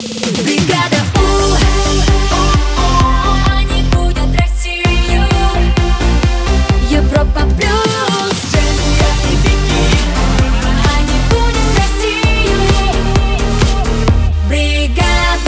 130bpm Main ID, jingle; Europa Plus

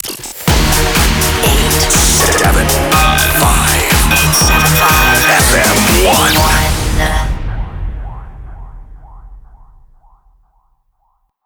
Rapid Fire, 122bpm Dm, jingle

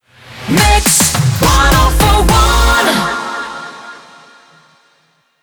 105bpm b Rapid Fire; WBMX 2017

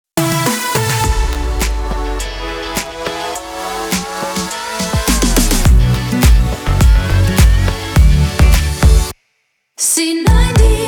Jingle 104bpm A Ramp

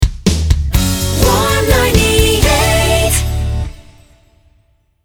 Medium Hot 125bpm D Rapid Fire, jingle; Warm 98

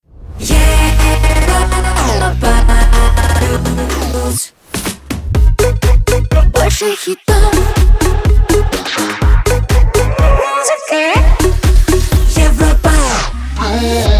Jingle; Europa Plus

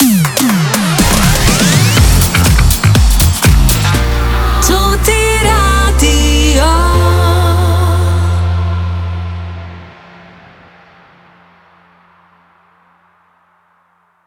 Transition Hot Soft, jingles; Toti Radio 2023

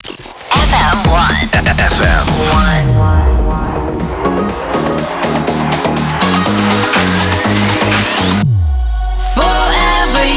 FM TOH 122bpm Dm main ID, jingle; 87.5 FM1 Manila

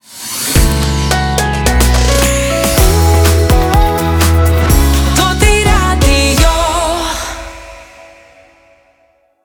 Transition Medium Fast, jingles; Toti Radio 2023

